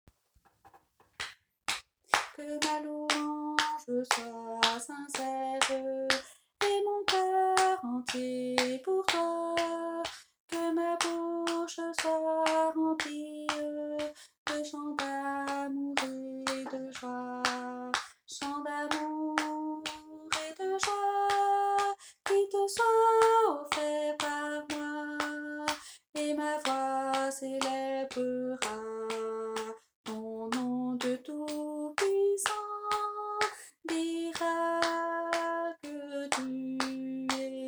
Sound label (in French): alto